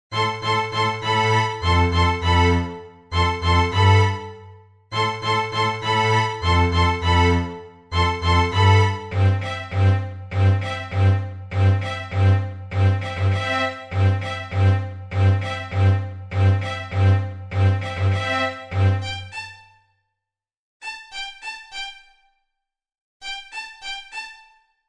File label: Strings